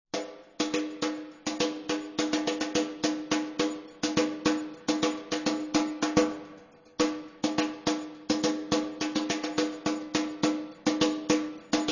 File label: Crossover Laendlerisch